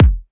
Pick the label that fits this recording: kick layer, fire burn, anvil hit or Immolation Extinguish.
kick layer